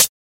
Mellow Hat One Shot C# Key, Royality free hi-hat sound tuned to the C# note; one shots; hat samples; C sharp